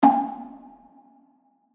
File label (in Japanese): 小太鼓